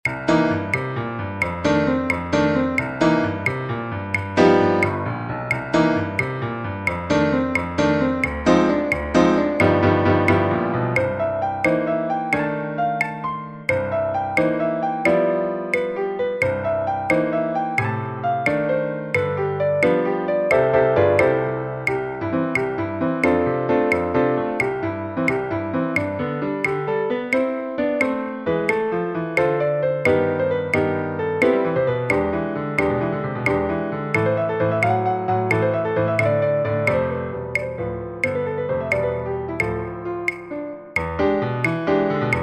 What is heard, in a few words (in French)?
blues Piano a 88 bpm